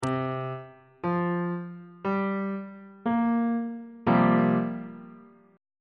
d double flat dominant 7th suspended 4th chord on bass clef